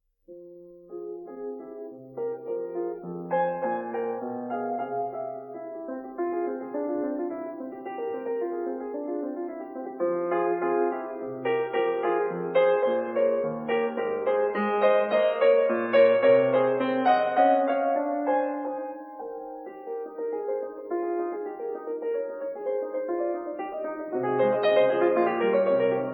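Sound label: No.12 in F minor Prelude, piano; BACH; WTC; BOOK II; Feinberg, Samuil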